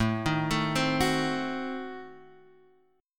A Diminished 7th A down

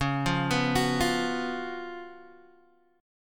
Db 11th Db, Guitar, DADGAD

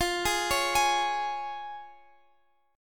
C sharp Major E sharp; C#/E#

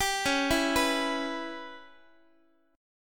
Db Minor 7th Flat 5th Db down Guitar; arpeggiated; JGuitar

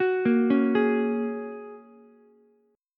A sharp Minor 7th Sharp 5th, Ukulele; arpeggiated; 11,10,9,11

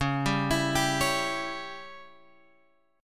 C sharp Diminished C sharp down Guitar Open G

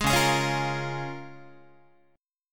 C sharp 6th C sharp Guitar; strummed; 4A,4E,5A,5D,5G#,6C#; C#6; 11,9,11,11,9,9; JGuitar

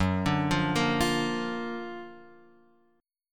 F sharp Augmented Major 7th, down

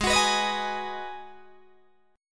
G sharp Minor 7th G sharp, Guitar Open D

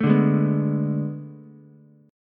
A Major 7th Suspended 2nd Suspended 4th, Ukulele; AM7sus2sus4; strummed; JGuitar

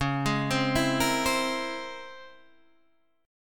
C sharp Minor Major 13th C sharp Guitar Open D

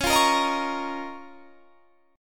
Db 6th Add 9th Db down; Db6add9; strummed

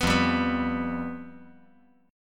F Suspended 2nd Flat 5th

